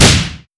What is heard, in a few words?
fire, weapons; gesource; rcp90